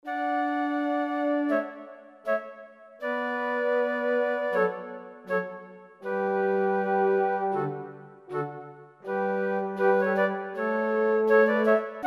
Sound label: musica canon simple flauta 80 A clasic